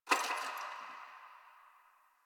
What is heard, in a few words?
Ice Crack One Off; Warframe; Ambience; Nineteen Ninety Nine; DE